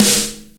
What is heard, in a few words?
snappy snare sample G sharp key, snare drum tuned to the G# note